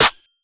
Snare Chop, drums

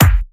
Kick; Ableton Live 12 Lite; Core Library; Drums

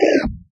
menu item bad activate; uisounds; There; ThereClient

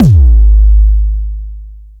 Kick; Ableton Live 12 Lite; Core Library; Drums

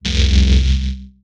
Smooth Synth C1; Multisamples; Synths; Ableton Live 12 Lite; Core Library

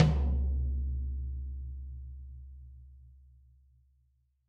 Tom 90s Heavy Rock; Drums

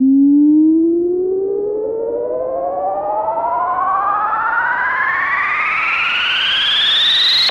Riser Sine

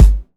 Kick; Ableton Live 12 Lite; Core Library; Drums

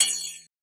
Tambourine Frame; Drums

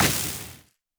aether weak; action; impact

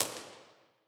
Real Places Foundry L, Impulse Responses; Verriere; Ableton Live 12 Lite; Hybrid

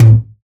Tom; Ableton Live 12 Lite; Core Library; Drums

